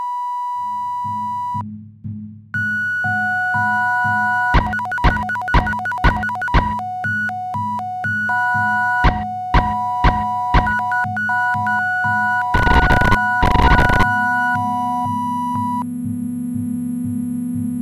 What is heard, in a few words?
Sinewave